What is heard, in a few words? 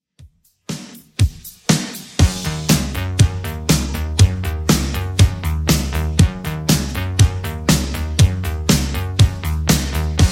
80s Redrum 120bpm